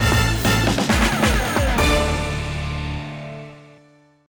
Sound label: sting out; Buzz! The Mega Quiz; PS2